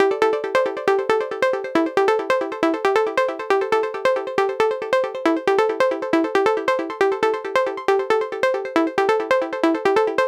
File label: 137BPM Pluck WET, D minor; Melody & Synth; Vengeance Samples; Universe Kit